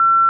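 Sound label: Aircrafts Cockpits Morse TACAN Morse Dash; DCS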